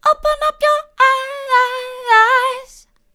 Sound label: mid, vocal